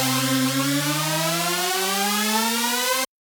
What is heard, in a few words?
synth